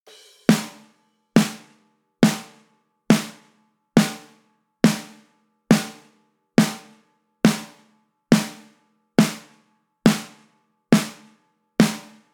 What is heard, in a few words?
layered sound snare layered